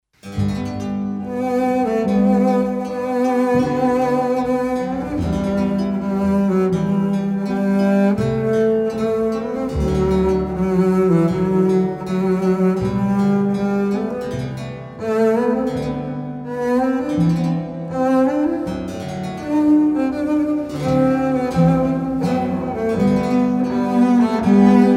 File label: Triosonate; GF Haendel